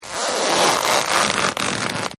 rubber stretch; holidays; snowbrawl

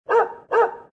dogs bark

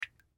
Target Click; kickin it; bobby wasabi academy

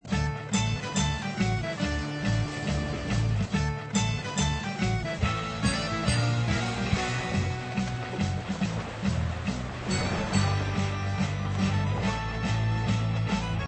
minigame loop; treasure hunt2

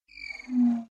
zoom OUT; kritter krawler

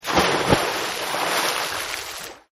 splash Landing; kickbuttowski; mellowbrook

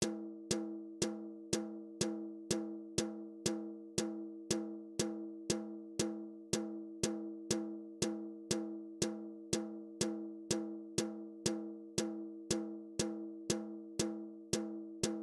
Little Lamb drums; pixie hollow; animal rhythm

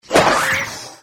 item oilslick; disneyxd; stuntastic

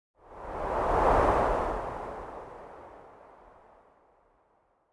sand; prince of persia

